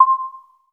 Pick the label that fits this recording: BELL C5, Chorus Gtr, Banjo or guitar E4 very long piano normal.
BELL C5